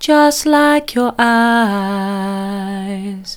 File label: soft, vocal